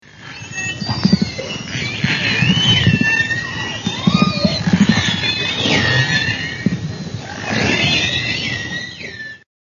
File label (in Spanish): Calderon